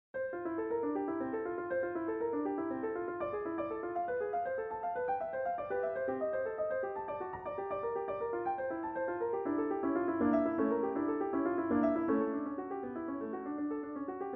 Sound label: Toccatina; Thieriot